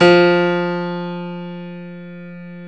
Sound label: Piano 2 F 2; Proteus 2000; Digital Sound Factory Proteus 2000